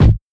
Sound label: 00s small reverb kick drum sample g sharp key